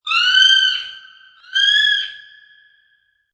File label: New Zealand bird; Exitoc bird - New Zealand bird "Kiwi" calling twice in the distance; Kiwicryst; Bird Sound Effects; Lynne Music